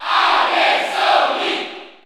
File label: Cheer French NTSC; Sonic; SSBU